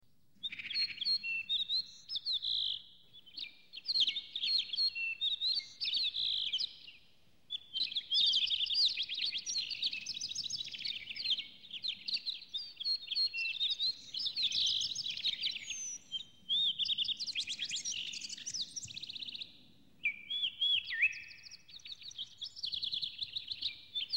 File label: Forest Birds